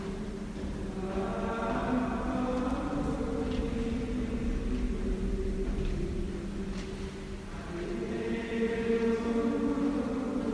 church

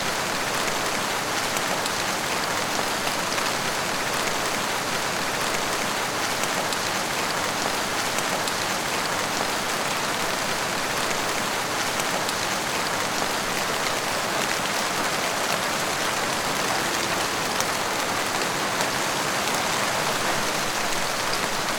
heavy rain

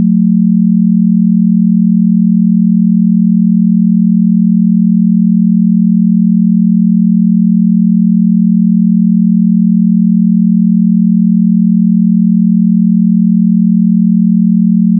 binaural gamma

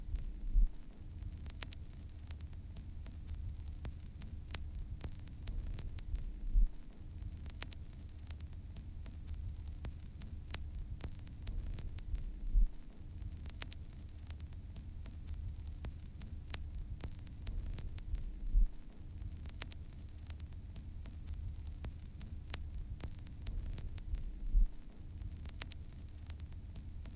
vinyl effect

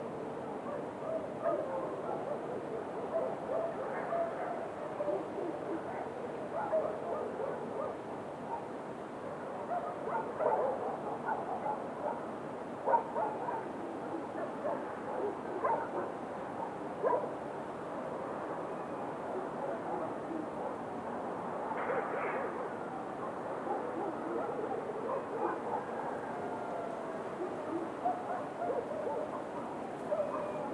night village

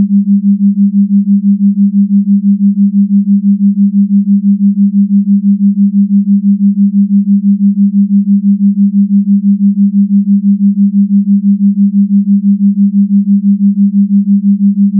binaural theta

